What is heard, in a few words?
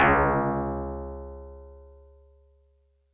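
Tubular Bells DX100 C sharp 0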